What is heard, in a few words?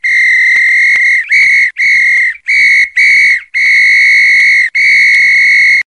whistle